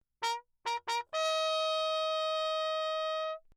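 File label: trumpet mute; Bach; Perinétventiltrompete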